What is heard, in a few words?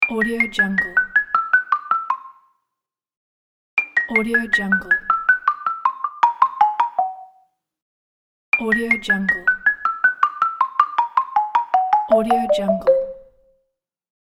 cartoon down the stairs